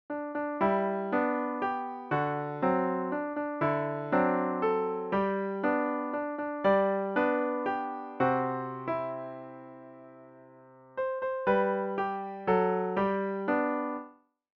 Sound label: intermediate piano; happy birthday